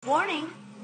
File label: warning